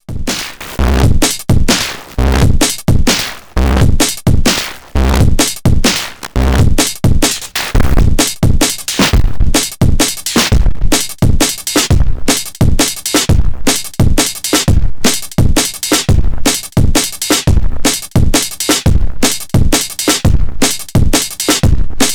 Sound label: Aliasing Beat